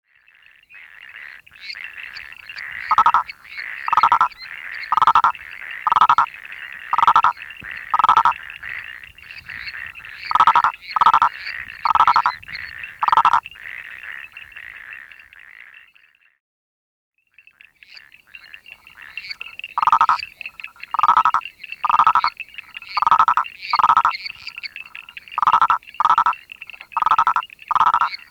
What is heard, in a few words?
Hypsiboas Prasinus